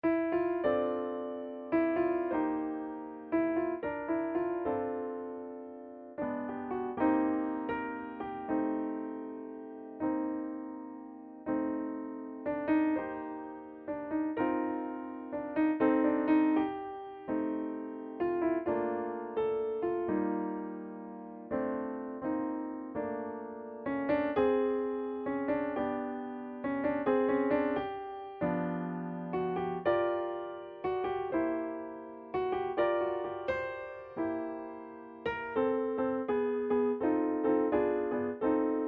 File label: Midi